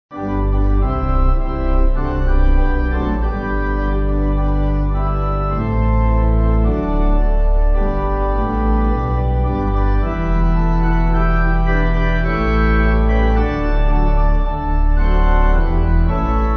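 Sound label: Pipe Organ; In The Little Village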